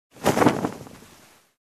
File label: Shake out Sheet